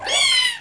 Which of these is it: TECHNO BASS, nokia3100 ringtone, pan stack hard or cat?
cat